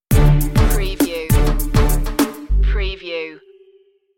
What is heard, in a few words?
tiempo de sol stinger